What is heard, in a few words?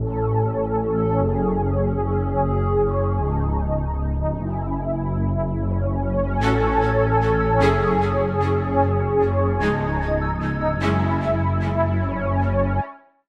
Melody Loop 150 BPM C# Min; Humble Drank Melody Loop; Cymatics - Humble Hip Hop Sample Pack